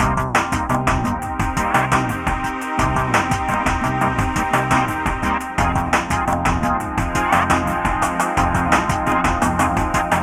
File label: Fantasy Racing Game Menu LoFi; action